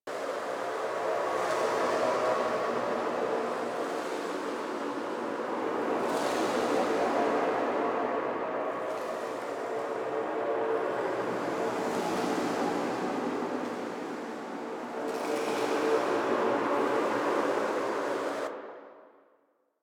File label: Landscape Tunnel Loop; Orokin Tunnel; Warframe; Ambience; Venus Open World; Positional Stereo Loops